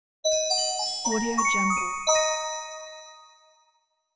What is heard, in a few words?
christmas bells holiday cheer logo